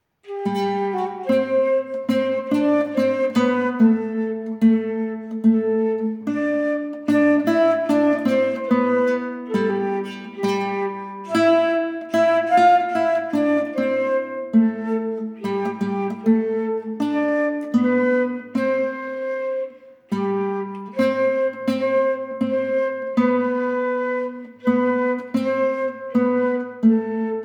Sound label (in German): Querfloete Gitarre